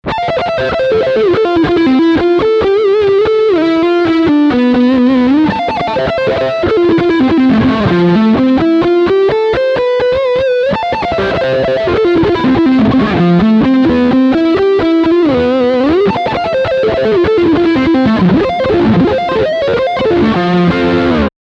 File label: Lick Front Humbucker